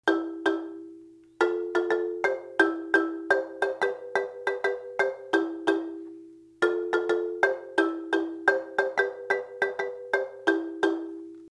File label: interlude, marimba